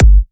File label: Bassdrum